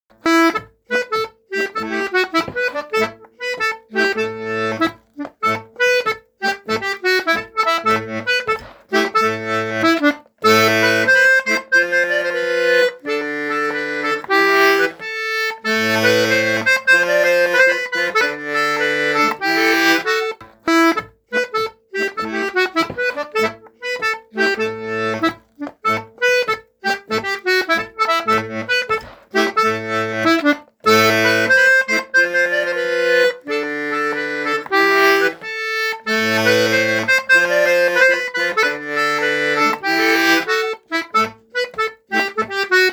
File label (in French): Rond Melodie; Ronds Du Quercy